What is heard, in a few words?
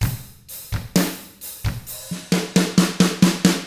129 ROCK, Drums; ILIO; Double Platinum Drums 1